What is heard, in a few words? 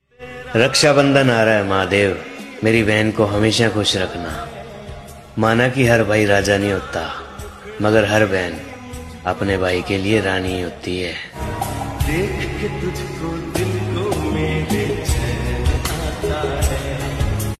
Ringtone